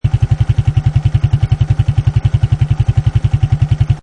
Quad Bike Idle Loop On Board Perspective; Quad Bike engine loop. Motorbike, motorcycle type Quadbike

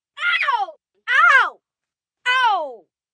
Pain Multi Ow, Ow, Ouch, 1 child, multiple

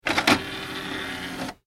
household dvd player tray open; Dvd Or Cd Player Tray Opening / Ejecting; Entertainment System; Electronics; McKinney Sound

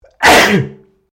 MALE SNEEZE LOUD; Male Vocal sounds; Sneezing (Sound Effects:Human)

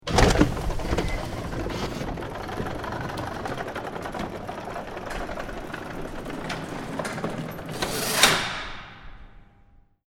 Heavy Electric Motorized Door Open, Garage door; operated by motor and chains, electric door op

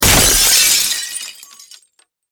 Impact Crash Glass Wood Break

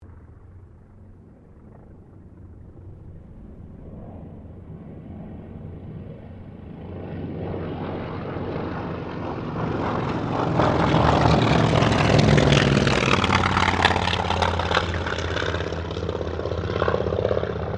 AIRCRAFT TROJAN SQUADRON 4 PASS

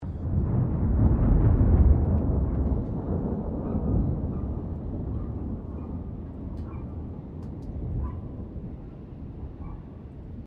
THUNDER DISTANT DOG BARKING; Elemental weather effects

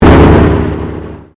Convolution Pulse

